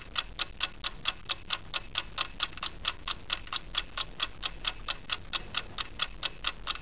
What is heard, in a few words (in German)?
UHR